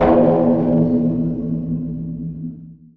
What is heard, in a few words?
stone bell; weapons; dota; earth spirit